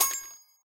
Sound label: Coins